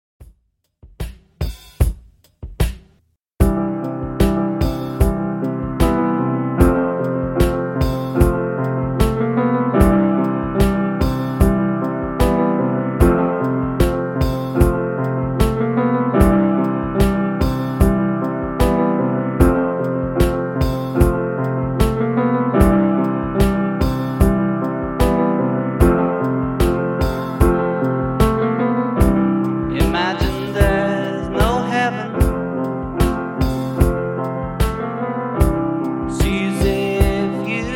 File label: 75 Bpm; Dj Intro Outro Clean